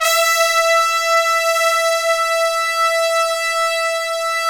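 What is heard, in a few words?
trumpets swell; Roland L-CD702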